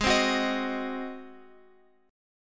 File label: G sharp 7th G sharp, Guitar Open F; G#7; x,x,8,7,9,10; strummed